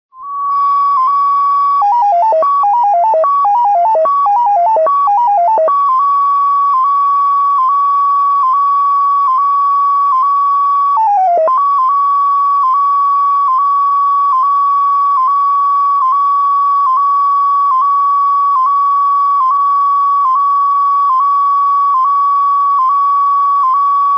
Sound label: eurosignal; dl4lbd